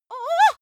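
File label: female scared comic, screams; schreie; menschen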